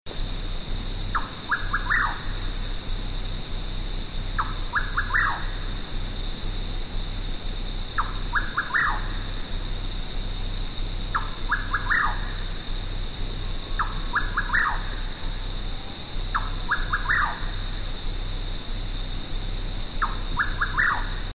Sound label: Rufous Nightjar; S. America